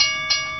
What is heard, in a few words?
bell; 暴力赛车